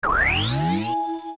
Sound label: Arrow Select Ice; zelda; OOT